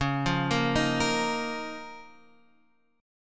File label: Db 6th Add 9th Db down Guitar Half Step Down; Db6add9